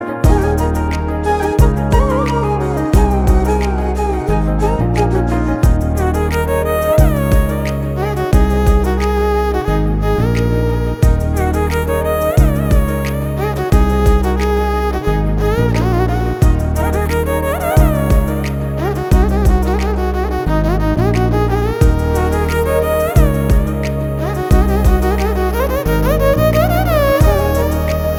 Love BGM Ringtone; Arvindha Sametha

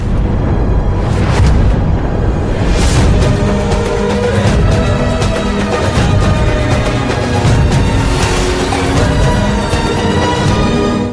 BGM Tone

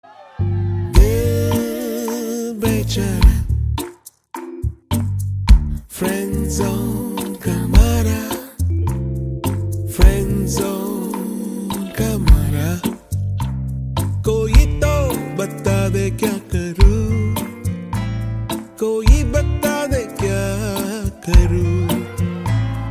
Movie Songs; Dil Bechara

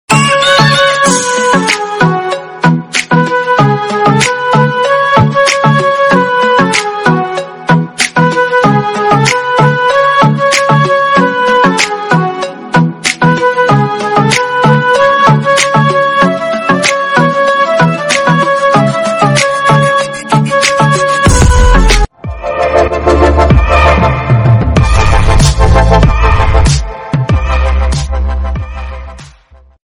Telugu love

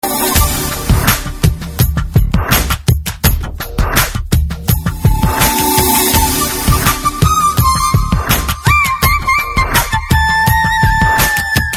BGM Ringtone; Deviputrudu

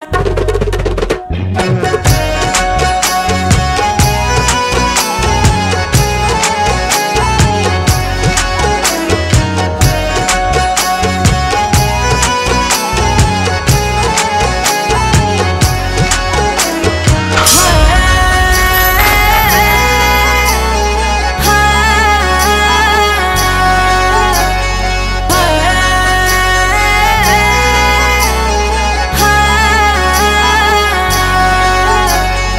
Instrumental Ringtone

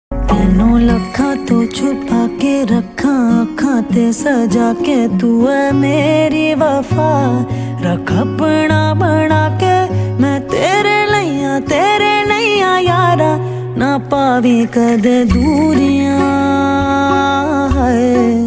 Ringtone Female; Tiger Zinda Hai

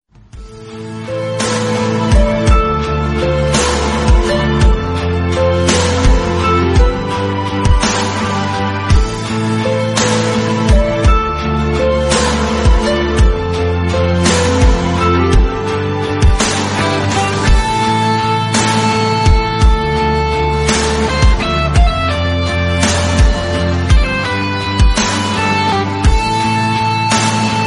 Instrumental; Kabir Singh Movie

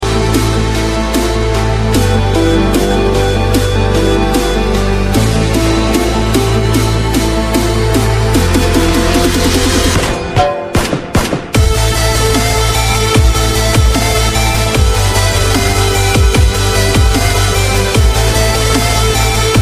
Theme Dubstep Ringtone; PUBG

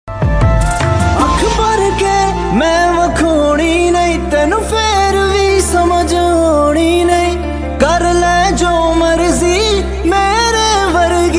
Sad Punjabi Song Ringtone

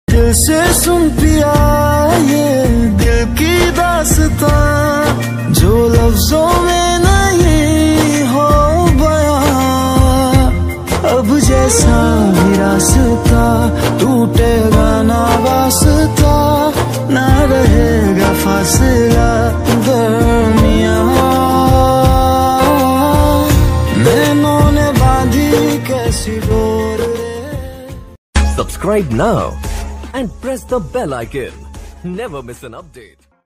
New Love Song Hindi Ringtone

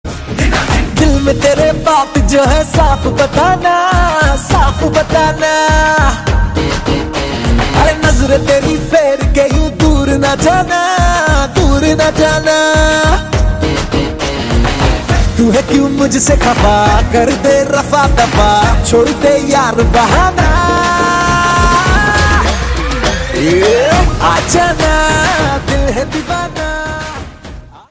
Song

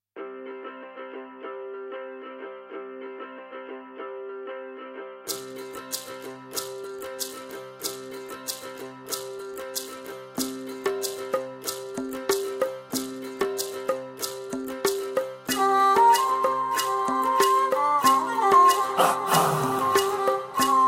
Kannada Tone

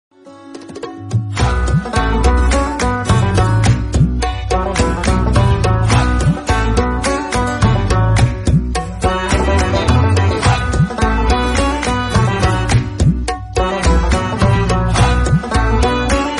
Telugu Instrumental Tone

